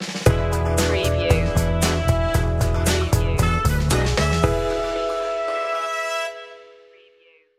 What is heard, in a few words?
funky groove stinger